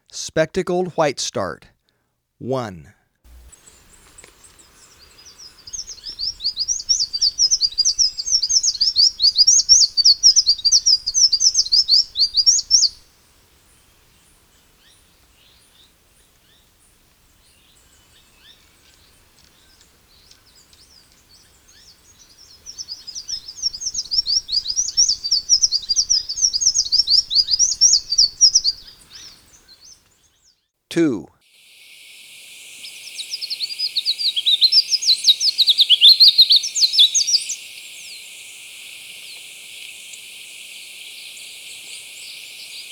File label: Spectacled Whitestart, Birds of Ecuador; S. America